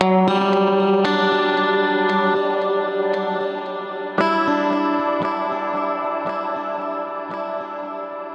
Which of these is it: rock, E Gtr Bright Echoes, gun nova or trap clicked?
E Gtr Bright Echoes